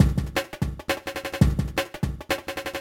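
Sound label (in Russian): барабанная мелодия 170 bpm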